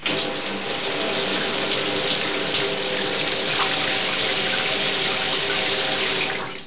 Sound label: SHOWER; 2000 Sound Effects